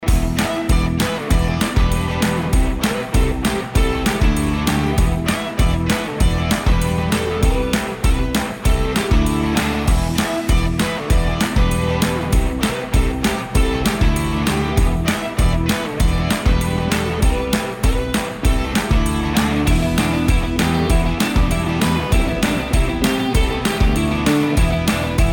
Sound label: BGM; Ludo Game